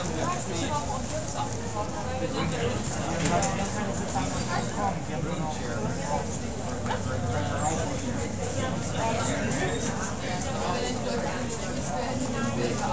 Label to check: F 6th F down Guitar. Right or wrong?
Wrong